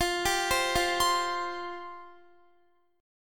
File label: F Suspended 2nd F down Guitar; Fsus2; arpeggiated; 4E,4A,5D,5G,5C,6F